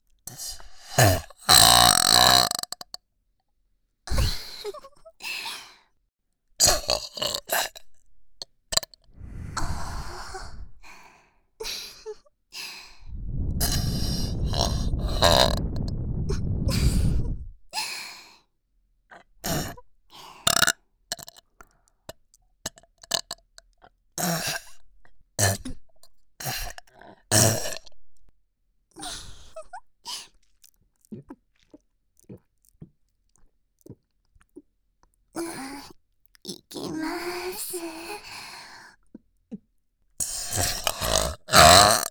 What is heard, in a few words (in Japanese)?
ゲップまとめ